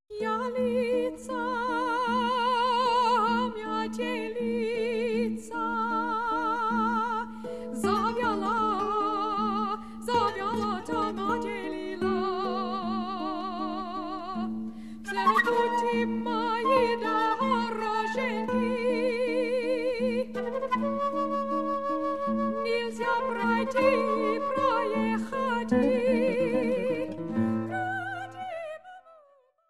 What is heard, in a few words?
Four Songs for Voice, Flute, Harp; chansons